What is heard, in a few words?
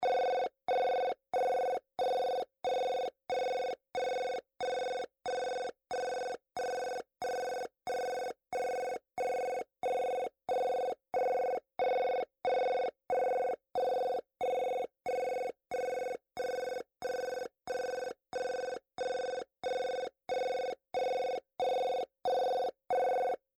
Dial